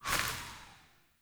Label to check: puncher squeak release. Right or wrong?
Wrong